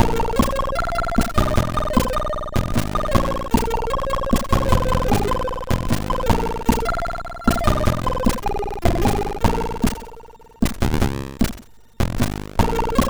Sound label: Were Board Intro, MOS6581R4; sid